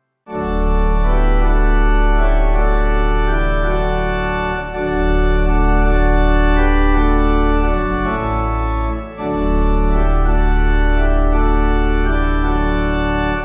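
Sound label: pipe organ hymn; Watchman Tell Us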